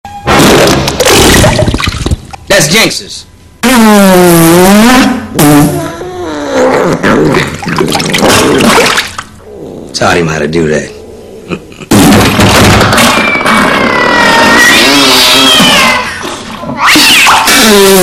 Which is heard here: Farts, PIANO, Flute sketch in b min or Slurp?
Farts